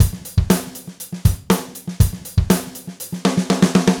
Gretsch groove 90 BPM, 1967 Gretsch Kit drums

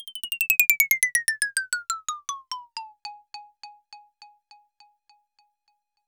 Xylo Seq; Roland BK-5

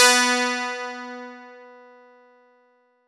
B2, PIANO; AKAI S-Series CD-ROM Sound Library VOL-8

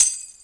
TAMB, Akai MPC-3000; 300 Drum Machines

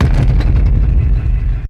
BANGS; Megabass Remix! 2

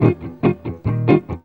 EGUIT BREAKS; Best Service; World Colours

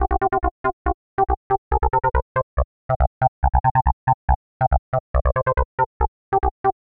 140 C bass, Trance, Bass Loops; Trance Explosion Vol1

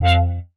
bass tweak scree F; Polyend; ARC Noise

